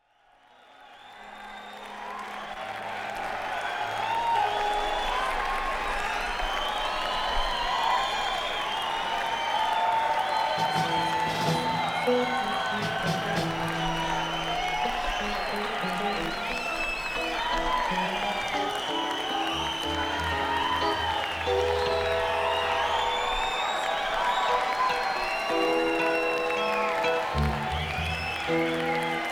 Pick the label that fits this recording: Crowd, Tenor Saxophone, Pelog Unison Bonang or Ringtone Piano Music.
Crowd